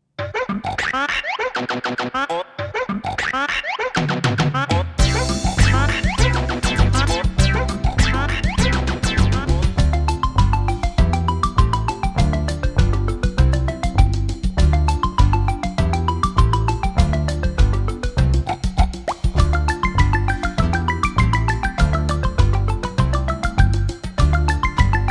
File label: Pizzicato Samba